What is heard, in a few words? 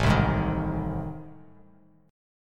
A Minor Major 7th Flat 5th, Bass Standard; strummed; AmM7b5; JGuitar